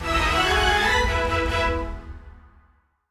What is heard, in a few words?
Continue (Jingle); toki